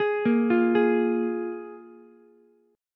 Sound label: F Minor Double Flat 5th F Ukulele; Fmbb5 arpeggiated; JGuitar